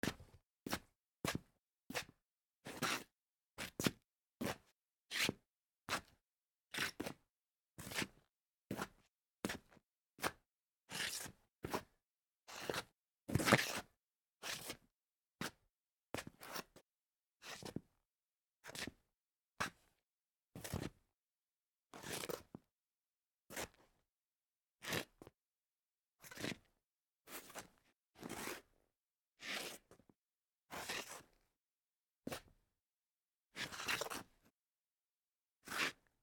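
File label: shuffling footsteps on tiles; sharkayushchie shagi po plitke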